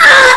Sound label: pain; alienarena; xeno